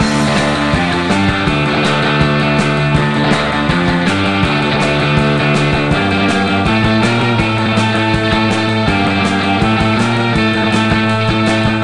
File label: Music Beach; Beach Buggy Blitz; Android